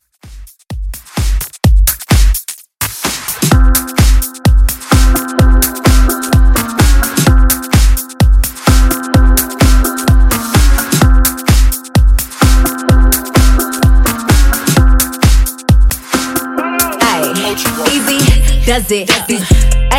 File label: Transition Edit 128 73 Bpm